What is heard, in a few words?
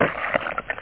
squish; rwcyber